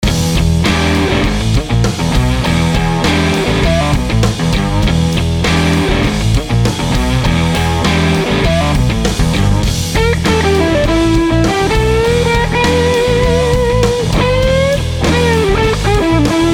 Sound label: tube drive strat; effectrode